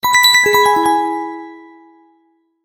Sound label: Flicker; ZTE Axon-M